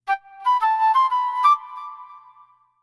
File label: Flute; Samsung Galaxy-E5